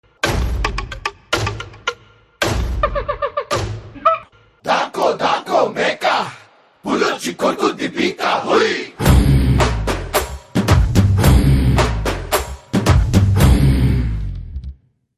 Promo BGM Ringtone

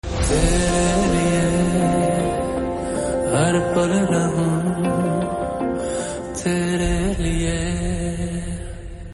Slow Voice Ringtone

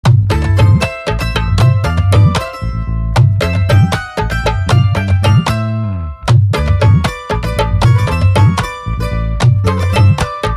Love Bgm

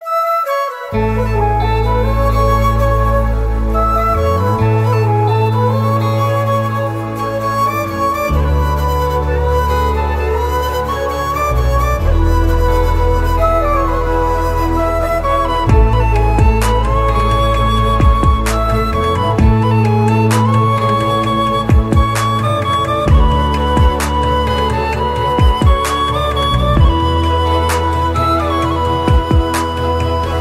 Mother Song Flute Bgm

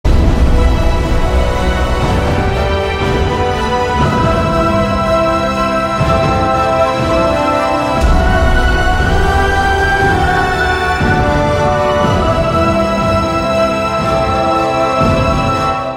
Love Bgm

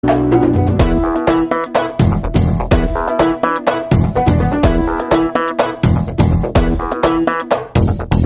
Love Bgm Tone

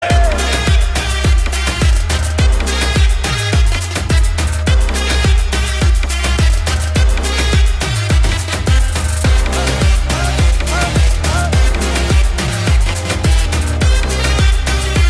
Happy Birthday BGM

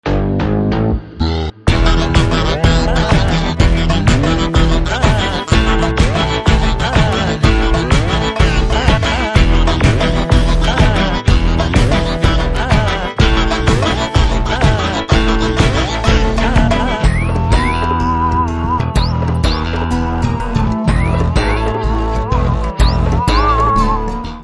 BGM Ringtone

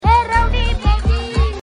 Message Tone

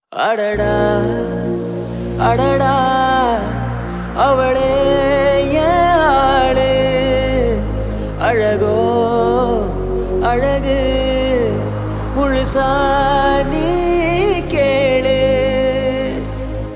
Starting Ringtone

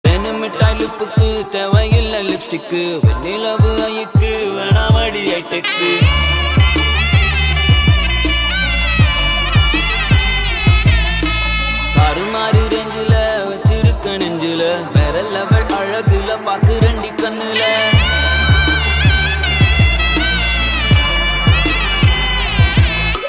Love Ringtone

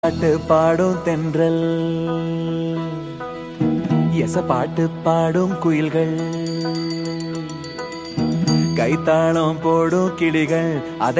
Ringtone, Tamil; Kaadan

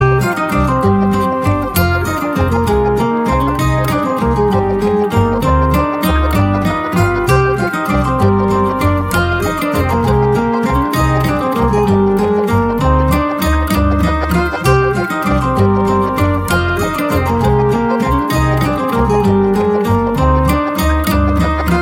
Love Theme BGM Tone

